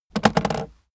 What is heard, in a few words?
aluminium case handle bounce heavy